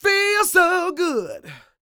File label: SING, Vocal Planet Blues Soul BLUESY